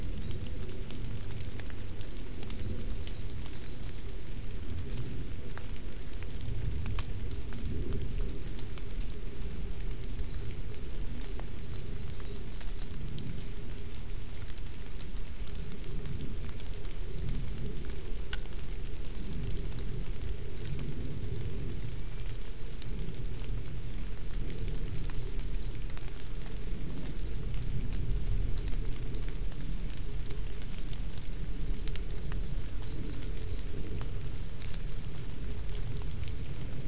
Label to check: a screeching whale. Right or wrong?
Wrong